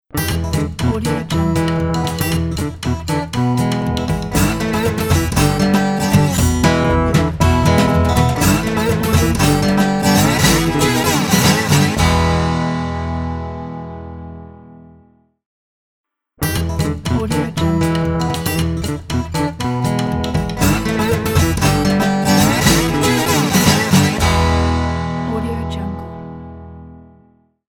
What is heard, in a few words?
blues logo